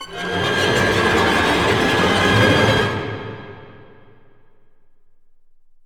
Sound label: Disgusting; rise of nightmares xbox 360 gamerip 2011